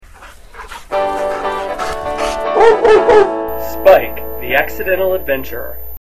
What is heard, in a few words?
jingle